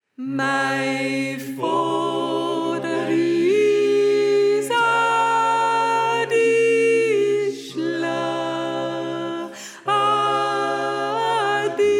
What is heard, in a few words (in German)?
Sopran